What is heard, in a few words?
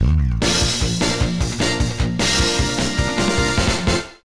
Jingle